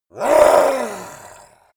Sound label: yeti attack shout aggressive; schreie